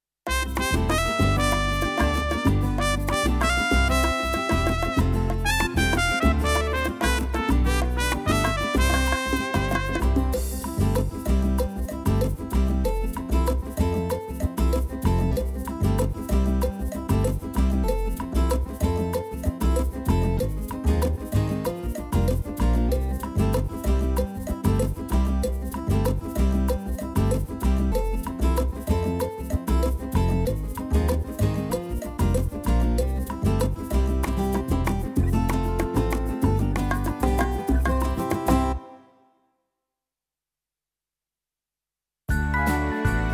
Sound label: CVP609 Styles